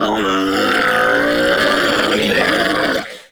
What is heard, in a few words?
predator lure call; Warframe; Hunting Critters; Characters